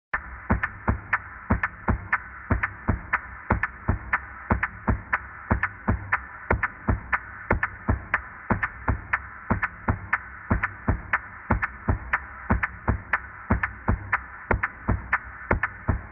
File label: drum